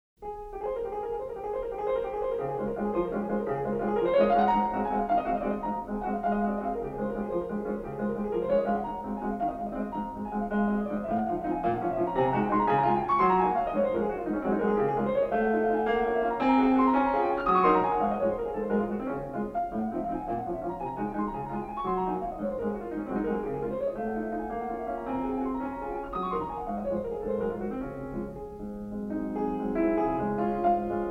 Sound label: Waltz for piano in D flat; No. 6; chopin